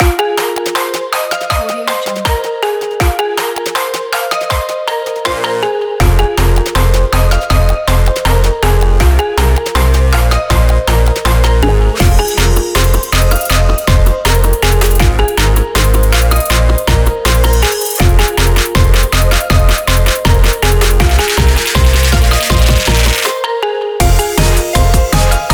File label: asian travel